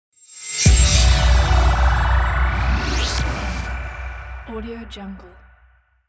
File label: short logo